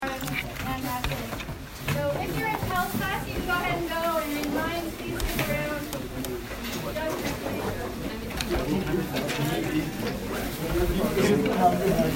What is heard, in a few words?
Leaving Class